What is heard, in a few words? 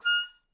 Melodica Short; Short Notes Chromatic